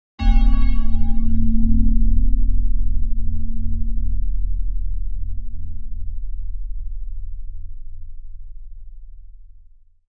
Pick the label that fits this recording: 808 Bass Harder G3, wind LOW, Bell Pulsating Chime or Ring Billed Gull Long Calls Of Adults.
Bell Pulsating Chime